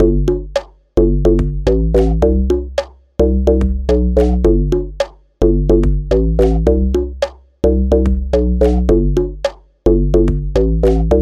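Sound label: calm drum and bass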